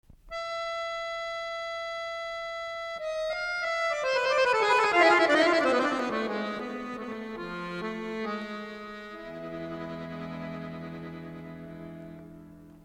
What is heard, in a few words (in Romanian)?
acordeon